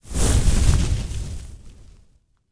Armor Passiv

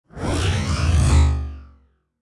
Teleport

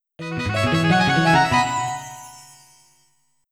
In Tune; Hey You, Pikachu!; N64

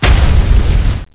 Fire Attack; Knight Online

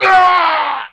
Male Die; Mars Sortie

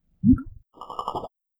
Jelly Idle

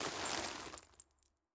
Gnoll Laugh

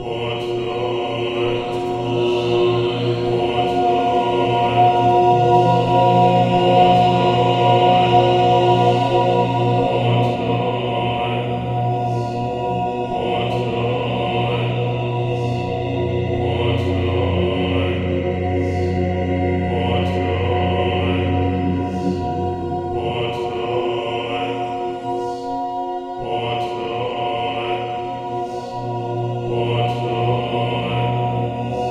Ambience; Button Heaven

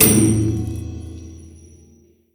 Ui Credit Inserted; rampage arcade gamerip 2018

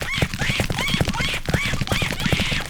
Charge Loop; Hollow Knight; Grub Mimic Charge Loop

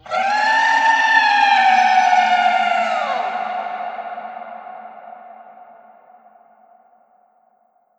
Piles Bones Horror Scream

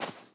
Footstep Sand; viewfinder

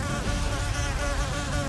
Big Bee Charge Loop; gamerip

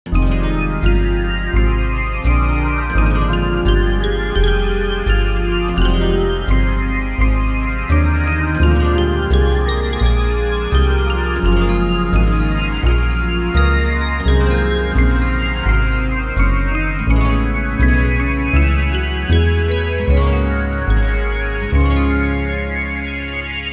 Ending; no one can stop mr. domino

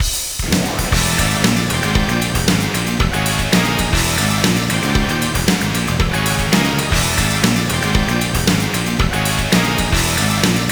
Ending; Midnight Run Road Fighter 2; Konami ZR107

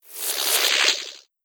Jelly; Legend of Keepers

